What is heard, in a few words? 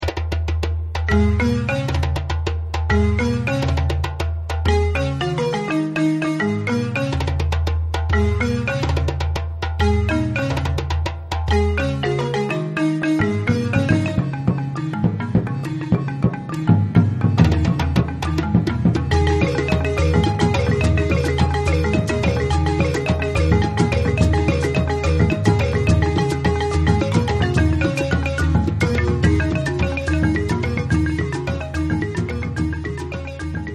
sanza; sunguru